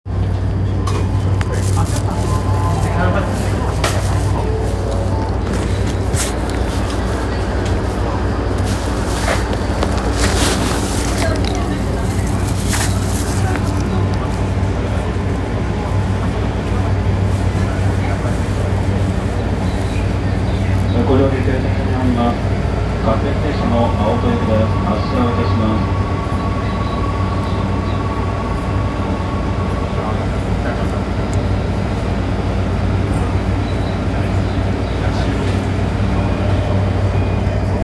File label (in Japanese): 京成押上東